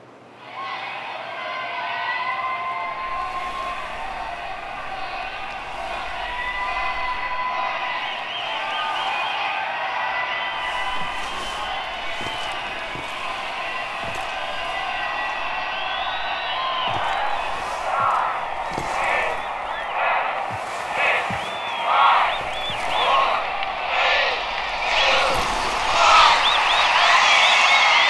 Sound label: Kiss; Aoi; Warframe; Nineteen Ninety Nine; Quests; Romance NYE